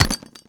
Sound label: bounce, grenades